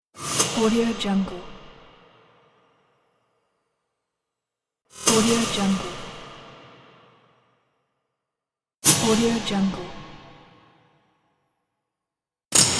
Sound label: transition woosh